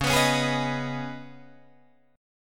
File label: C sharp 7th Suspended 2nd, C sharp, down, Guitar, Drop D